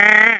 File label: mole die; classicro